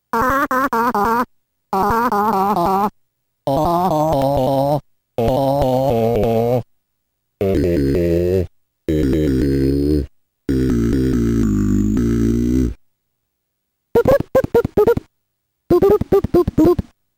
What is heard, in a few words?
dx samples test; apple2